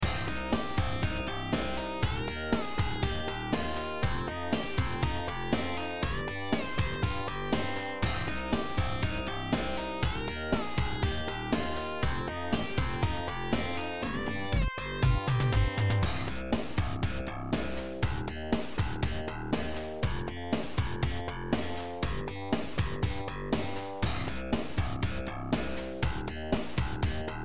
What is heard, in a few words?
bass basstone lead sound beat